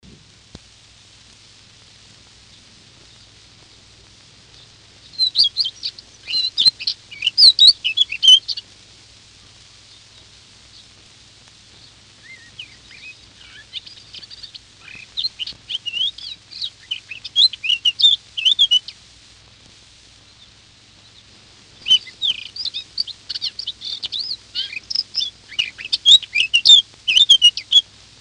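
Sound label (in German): Mönchsgrasmücke; Vogel; hoerstation vogel wg platte 1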